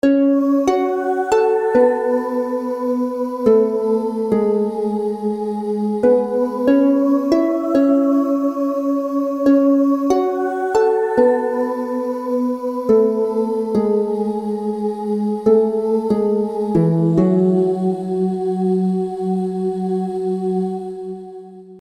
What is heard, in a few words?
Concert Harp, Choir; Gladiator; Chordophonet; Magnus Choir; Abbey Ghost Synthetic V; Syntheway